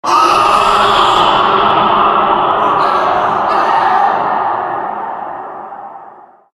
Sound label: male scream, halloween; Source Engine; tf